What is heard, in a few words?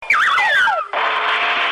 fall